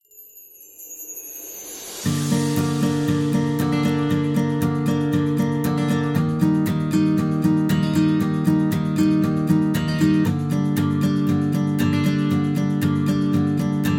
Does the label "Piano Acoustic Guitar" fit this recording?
Yes